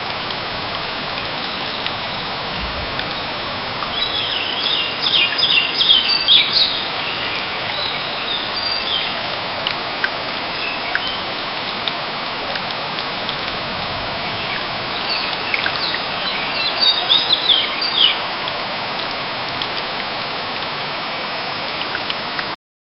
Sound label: the sound of the jungle, animal roar